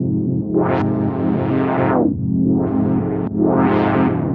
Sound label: 110 Fm, Melodic Loops; M8; Electronisounds